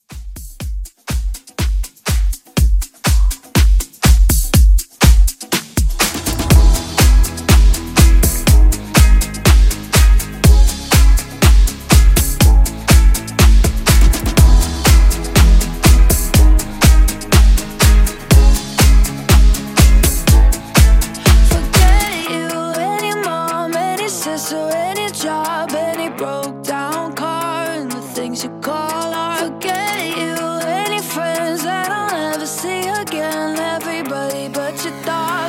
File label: House Re Drum